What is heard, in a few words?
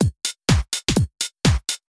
drum loop house 125; eJay